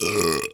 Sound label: burp